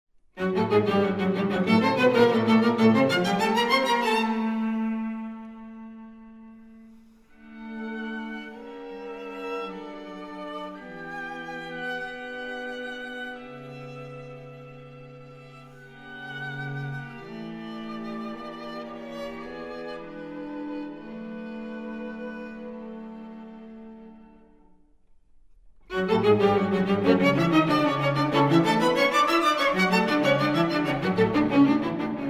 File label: Quartet for Strings in D major; Franck